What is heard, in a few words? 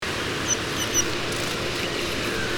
pendtit; linum tei